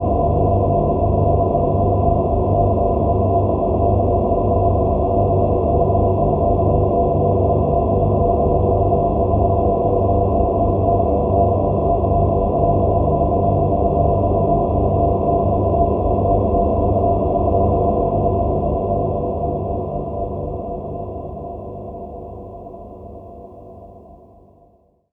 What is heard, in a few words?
Dark Choir, Ambience; Sonic Foundry (Sony Creative Software); Numina II